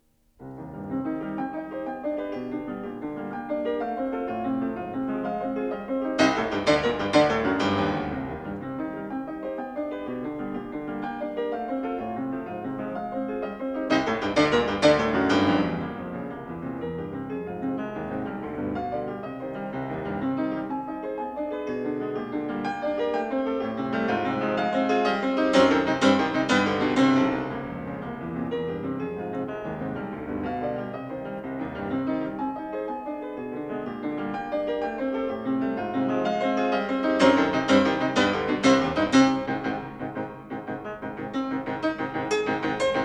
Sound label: sonata for piano; L. Betkhoven; No. 13; II. Allegro molto e vivace; 6-7 klass dmsh